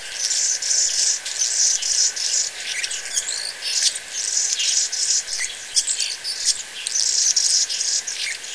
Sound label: annas